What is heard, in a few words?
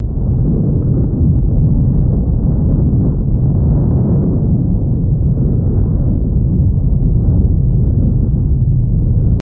Volcano Rumble Loop